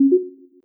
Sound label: Dismiss Stack